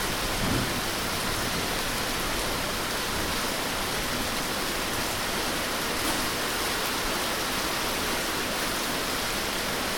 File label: rain, nature